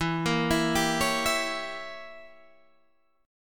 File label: C sharp Diminished 7th E